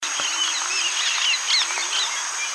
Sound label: Blue collared Parrot